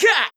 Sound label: combo, onehand sword; assassin